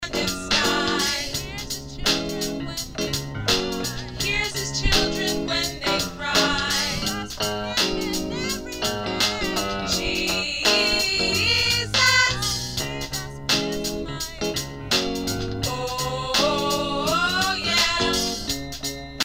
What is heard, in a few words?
Alto, choir parts